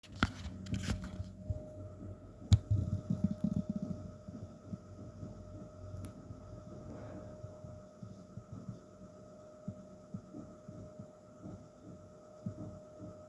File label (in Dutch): Drummen